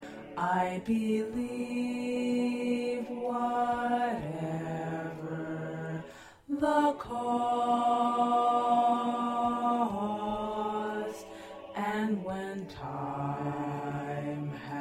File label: Bass